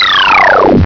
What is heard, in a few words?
scifi